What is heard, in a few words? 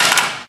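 physics clipboard impact small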